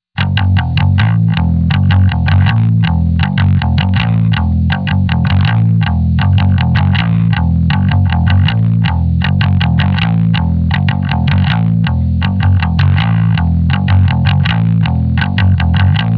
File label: xvive bass